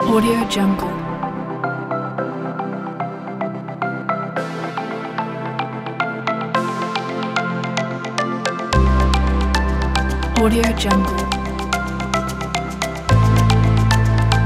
soft tech

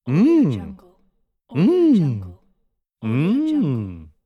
male mmm

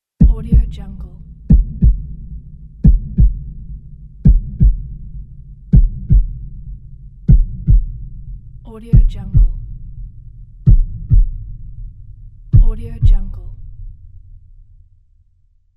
heartbeat stops

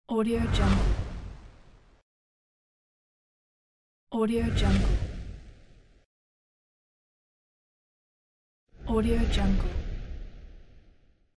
fire flare

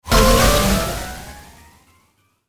sea witch spell

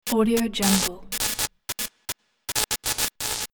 neon sign flickering

